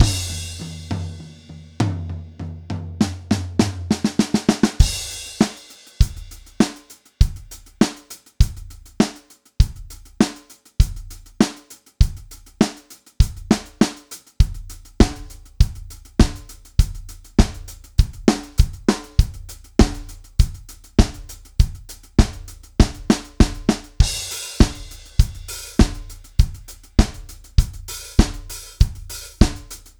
rock pop kit style

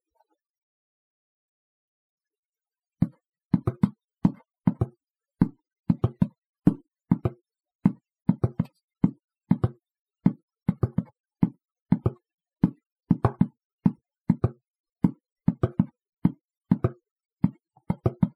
Perc; Collabunity Perc Two

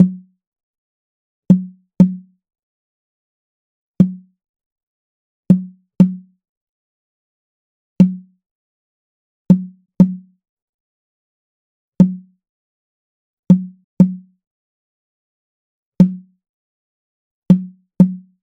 Cajon Low from Udu & Cajon Drums; Udu (Ibo)

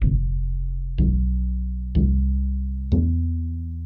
bass

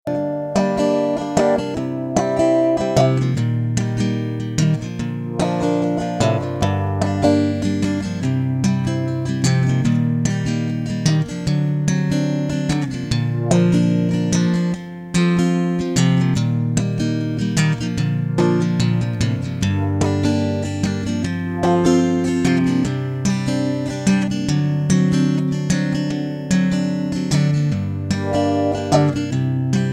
Gm 148 strummy song chords mid cut filter

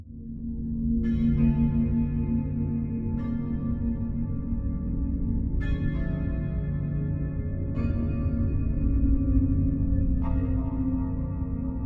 Armistice Ambience

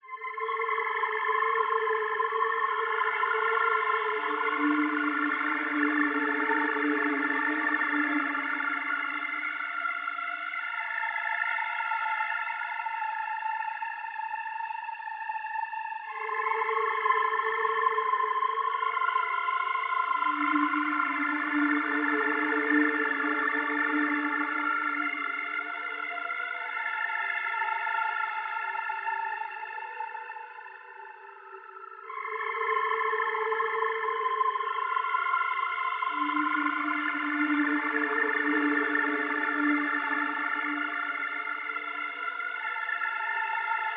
Am 120 Cinematic Mellotron Pad

